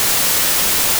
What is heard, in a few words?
noise